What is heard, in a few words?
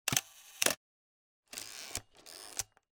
CAMERA MEDIUM FORMAT